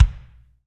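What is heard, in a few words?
bass drum, Real Kit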